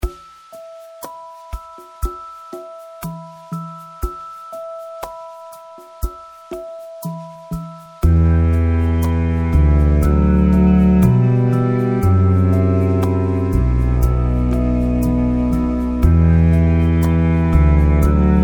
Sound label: Sad Song